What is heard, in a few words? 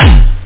BANG BASS, DRM PERC